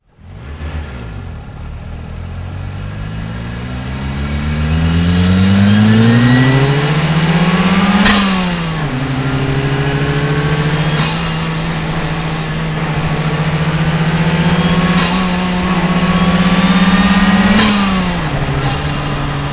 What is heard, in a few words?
Boost Sound